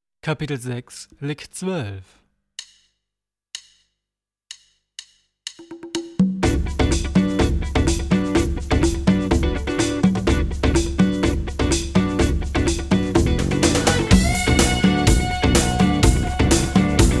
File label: Latin Style Guitar